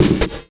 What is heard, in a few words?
Kick Snare; Esoterik Beatz; Saver Kit